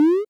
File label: boss jump